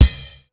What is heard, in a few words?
KICK, Hip Hop